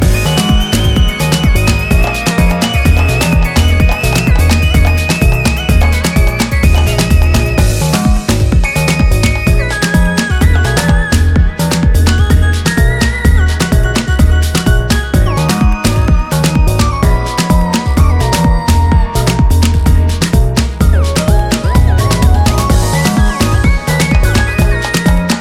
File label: Vocal Dub